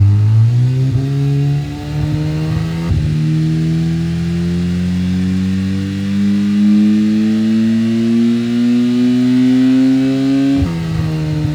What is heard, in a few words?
Motorcycle, Engine Rev Up; Warframe; Vehicles; Motorcycle Ninety Nine